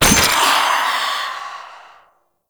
Courier Trinket Collected; Warframe; Duviri Murmur; Courier Delivery

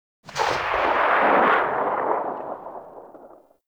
RPG Tail Ext; Hellion Elite

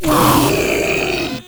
Proto Infested Ancient Vocal Pain; Warframe

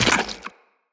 Run Goo, Footsteps; Tenno

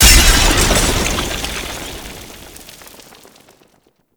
Vial Hazard Explosion; Warframe; Entrati; Hazards

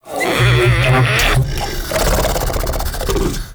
Ancient Tank Pain, Enemies, Infested, Barks; Warframe

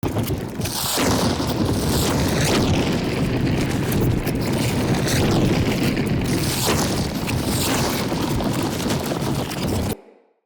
Damage Field Active Loop

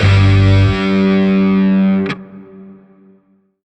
Shawzin Blues Chord J; Music; Warframe; Grineer; Chords

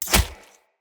Throwing Knives Impact Flesh; Weapon Impacts; Combat

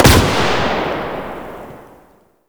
Rifle Shot, Weapons; Warframe; Zariman Grn Wraith Rifle

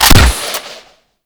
Hunting Bow Fire; Hunting Bow Prime; Weapons

